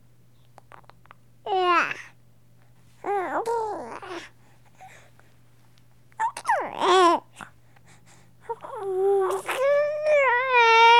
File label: Baby Various Sounds